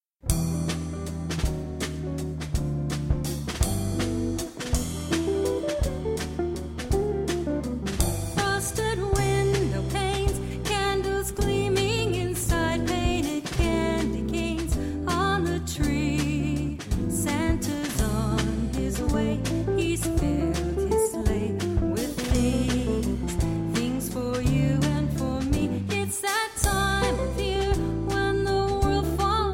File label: Christmas Waltz